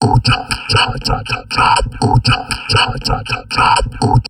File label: TALK 120, Drum Loops Crazy Processed